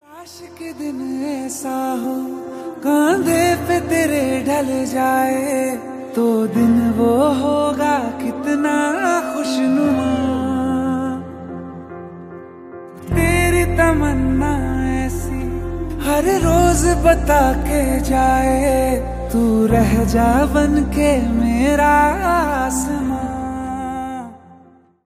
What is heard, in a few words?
Ringtone